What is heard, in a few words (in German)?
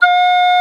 BLOCKFL TE E; FLOETE; Best Service; Alpine Volksmusik